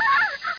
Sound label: gasp; Rayearth